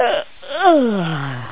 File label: yawn